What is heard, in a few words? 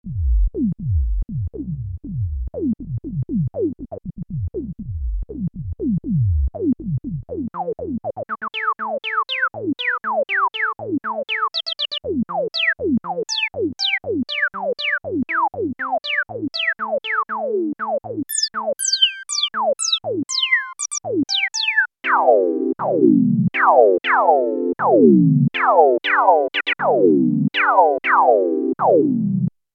access virus c filter lpf12 q100